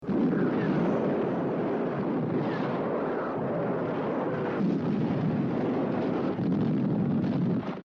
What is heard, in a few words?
bombing